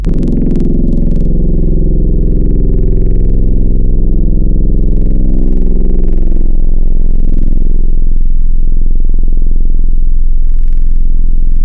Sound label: Les Paul Vox Overdrive Mid; Synthetic's Serum Collection; Surge Sounds - The Sky - Serum Wavetables